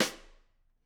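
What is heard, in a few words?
Snare Stick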